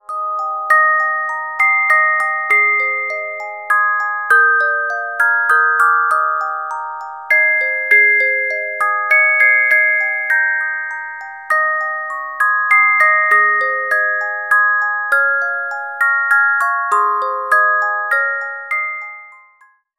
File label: Carillon Music Box Melodies